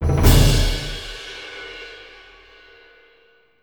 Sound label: Start Game; Fat Princess; ps3